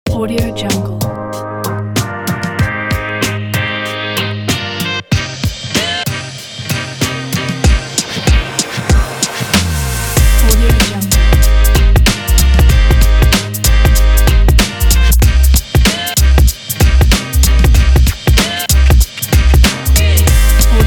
peppy hiphop

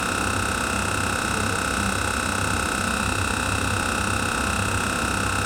broken ventilation fan loop

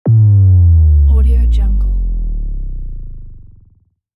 sub bass drop

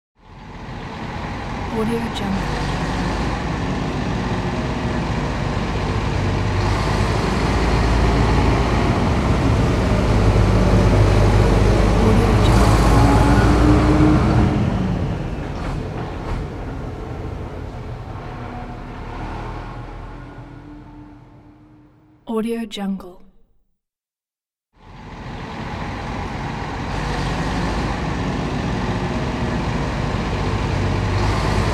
truck slowly drives past

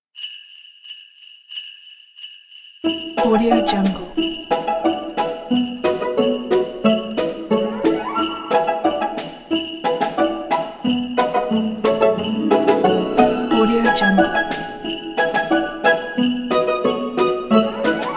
christmas cheerful music

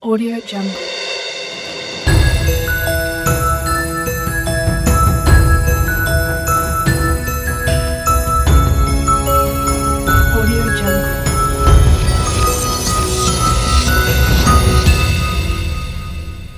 christmas eve logo

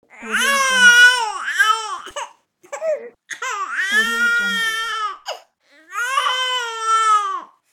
baby crying; polarbeatstudio

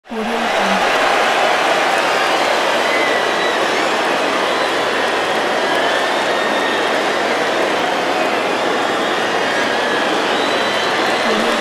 crowd

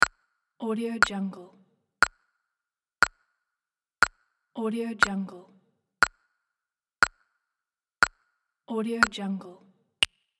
countdown beep

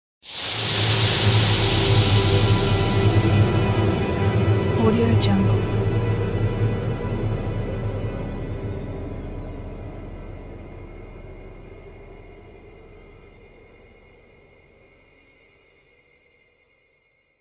powder hand

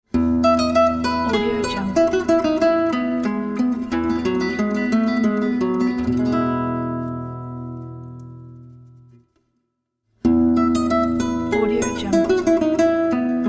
classical guitar logo; bach

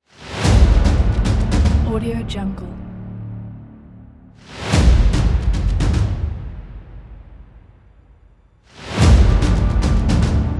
drums trailer; hardprosound

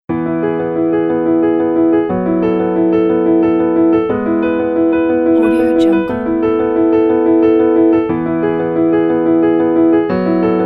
high spirit fast solo piano

